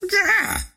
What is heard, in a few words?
whip fail; ringmaster